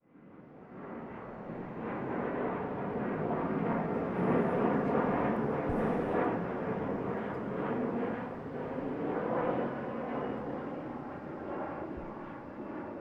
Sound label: airplane, dust2; ambient; Counter Strike Model Pack; CS:GO